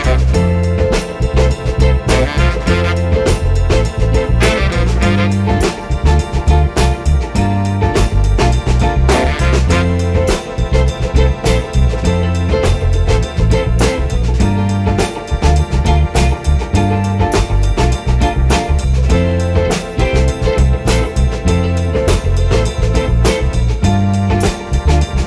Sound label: instrumental